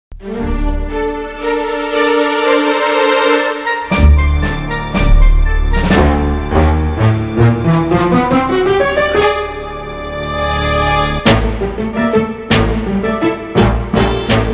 false alarm action; DieHard

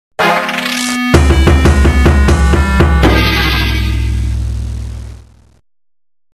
gameover; IceAge2 GBA

